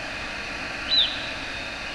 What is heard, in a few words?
Gray-cheeked Thrush; NY